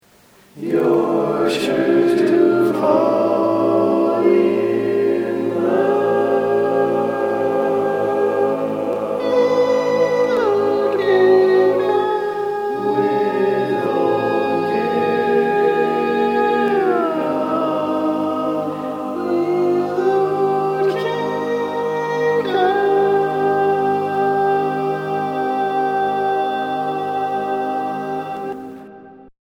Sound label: Tenor, barbershop tags